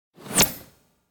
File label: Button Thin Turn On Off Button; Hollywood Edge; sound designer's toolkit v2